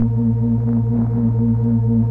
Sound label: keys Optigan; Roland LCDP09 Keys of the 60s and 70s 1